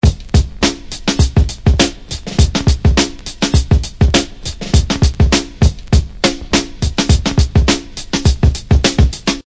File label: 101 bpm tight groove, drum loops and breaks; smell my